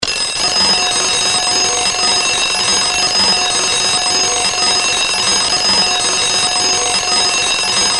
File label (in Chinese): 电铃